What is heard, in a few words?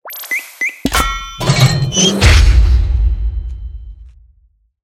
pick me selected sfx; pork knox